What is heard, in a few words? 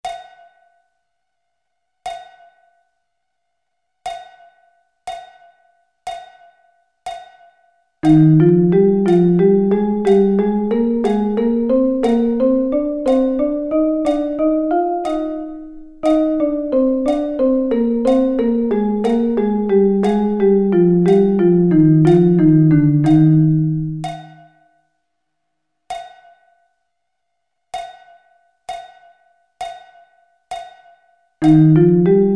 Eb Double Sticking